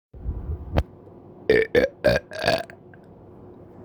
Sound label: burping sound